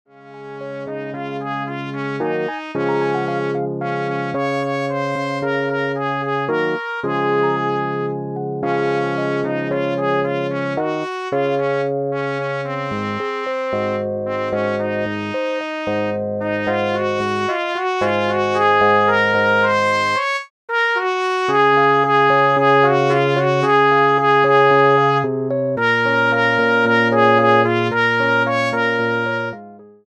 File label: Trumpet; brass